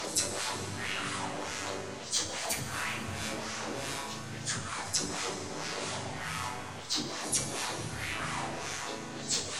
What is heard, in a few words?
laser abyssal background 100 bpm; synth; instruments; loops